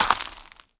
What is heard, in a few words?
Leaf, Footsteps; zps; Foley